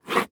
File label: Foley Footstep Golf Cleats Scrape On Cement Quick; Foley Footsteps